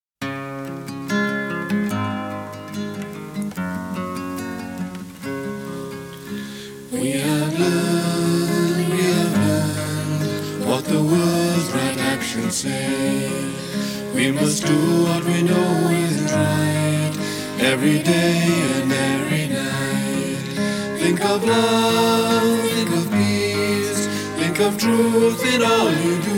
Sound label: right action song; english